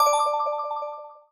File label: count Up End; netent